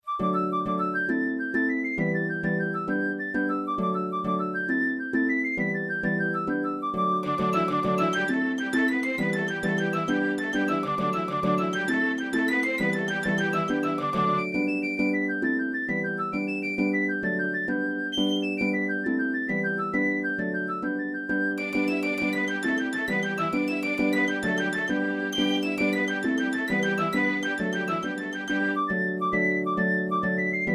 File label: Flute Strings Harp; Chordophonet; Syntheway